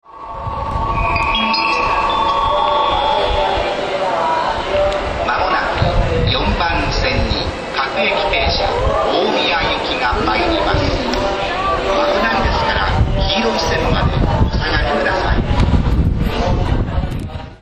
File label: approach, rail sounds; Stereo; Tokaido; Yokohama